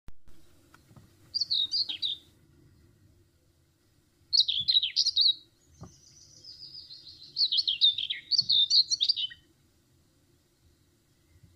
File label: curruca zarcera; aves